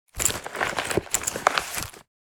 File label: Purse taking item out